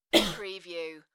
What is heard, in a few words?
Adult Cough; beanstalk audio